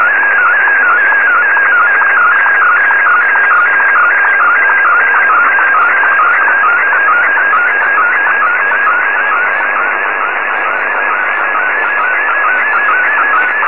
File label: sstv